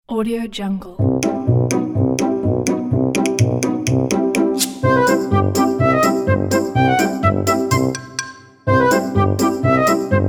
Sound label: cartoon children animation amusement park